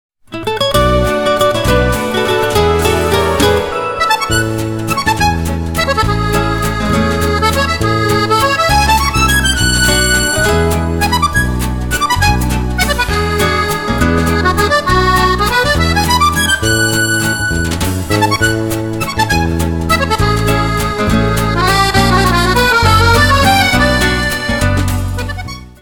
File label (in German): virituoses akkordeon